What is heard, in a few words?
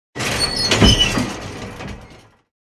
Industrial elevator open door